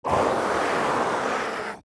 Cell Screams

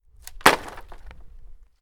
Dropping Wood

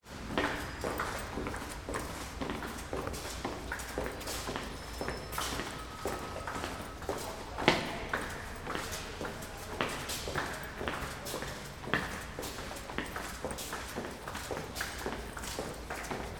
Subway Street Passing

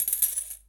Coins Big Sound

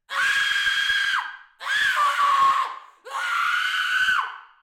Scream

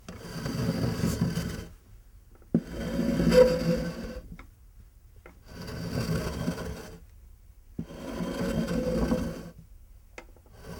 Wooden Chair On Linoleum

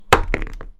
Drop Item Light

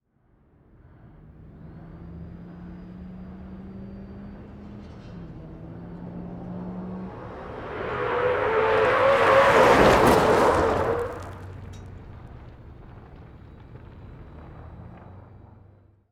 Drifting Around A Corner Pass

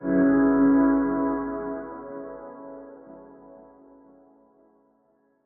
Ambient Piano Note

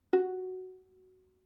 Violin F 5 Pizzicato Non Vibrato

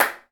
Clap Bathroom

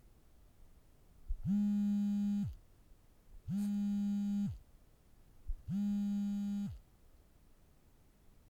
Mobile Handy Vibration

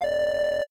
Counting Coins

